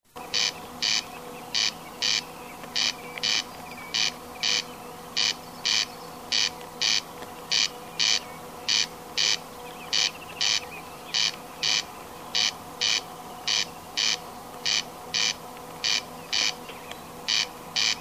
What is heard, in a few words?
Crex crex